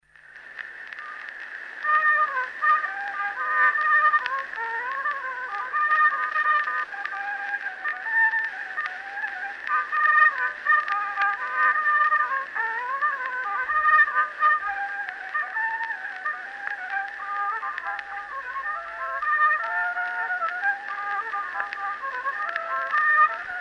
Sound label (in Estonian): Vana polka, viiul folk; Kuusalu; Eduard Aaman